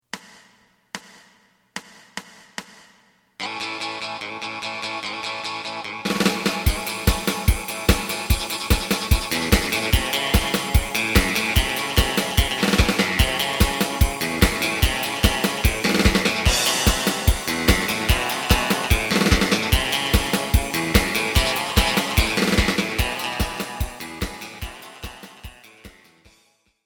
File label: bass